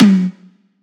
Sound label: tom orange; Drum One Shots; Slate; SD MIDNIGHT Sample Pack